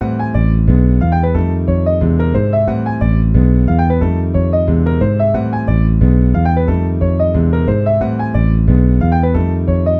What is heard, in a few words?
ii harms minor